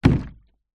industrial cannister small plastic liquid down hard ground; zapsplat